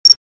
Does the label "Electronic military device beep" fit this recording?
Yes